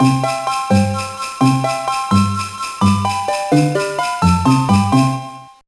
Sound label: All Candies Collected; The Polar Express; GBA